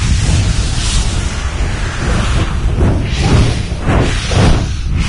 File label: idle, lostsoul; doom3